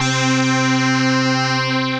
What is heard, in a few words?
Jamaica Bell Pans; Wavetable; Ableton; Synth Essentials